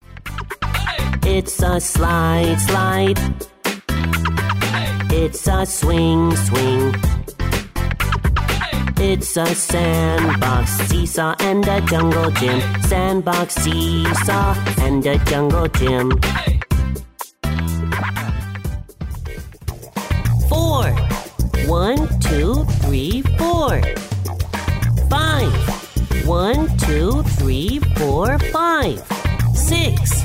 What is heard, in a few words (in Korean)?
영어남 Boy Song